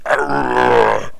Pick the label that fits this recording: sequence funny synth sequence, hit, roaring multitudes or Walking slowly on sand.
hit